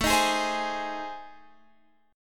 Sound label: A Minor Major 7th Flat 5th, Guitar Open F; strummed; AmM7b5; JGuitar